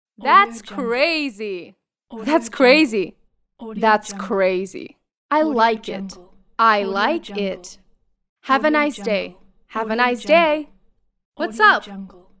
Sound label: female voice expressions